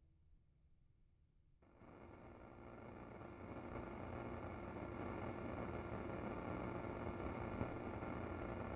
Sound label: Muffled radio